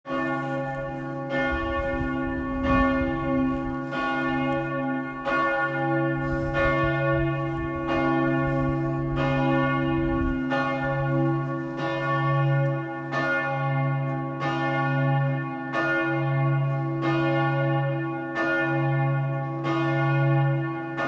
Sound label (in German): Grosse Hilligerglocke der Nikolaikirche; Freiberg